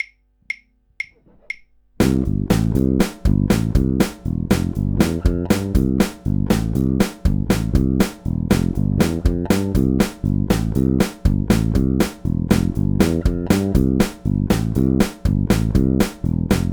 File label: bridge clean, bass; 120bpm